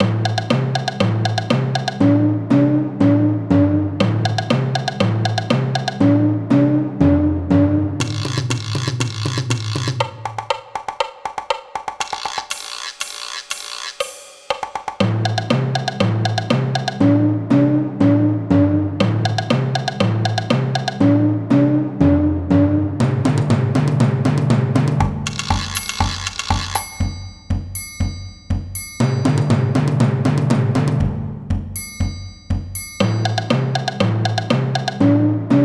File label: Drummer